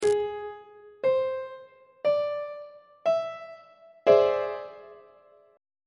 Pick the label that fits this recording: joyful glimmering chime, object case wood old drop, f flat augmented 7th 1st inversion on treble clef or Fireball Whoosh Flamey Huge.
f flat augmented 7th 1st inversion on treble clef